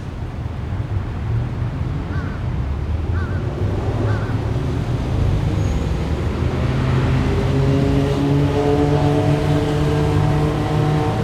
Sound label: street, traffic